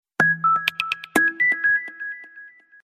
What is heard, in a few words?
ting tong message notification tone